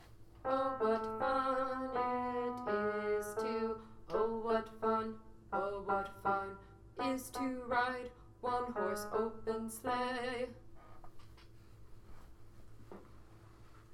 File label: alto